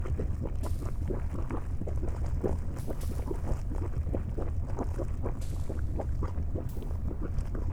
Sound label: Ambient Lava Pit; Among Us